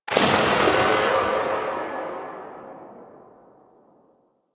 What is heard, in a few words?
Gorgon Tail, weapons; Warframe; Void Trader